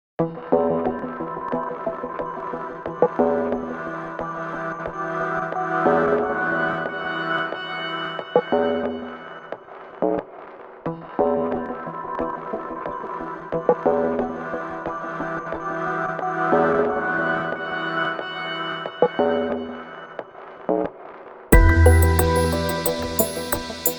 高级感氛围, 宝藏背景音乐

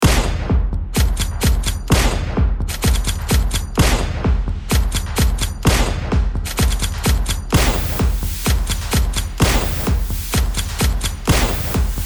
卡点快闪快手抖音高密度节拍枪声节奏短配乐

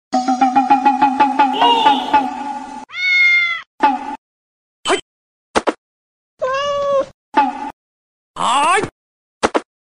轻松的搞笑氛围音

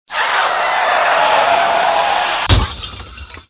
车祸碰撞; 搞笑音效

